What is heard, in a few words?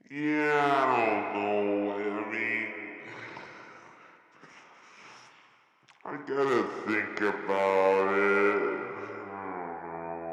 noise rock